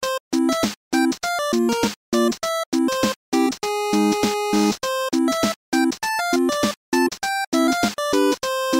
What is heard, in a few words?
intro bgm